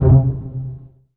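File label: bass pizzicato C3, Virtuoso Strings; ILIO; Kirk Hunter Virtuoso Strings VOL-3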